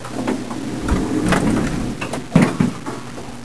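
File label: elevator door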